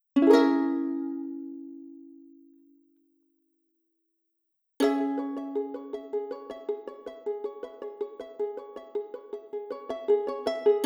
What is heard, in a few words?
ukulele